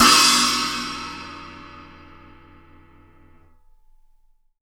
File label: CHINA14; Big Fish Audio; Ross Garfield Drums VOL-2; CYMBALS